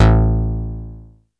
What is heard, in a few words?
Bass A1, Prophet Short Metal Bass; Oldies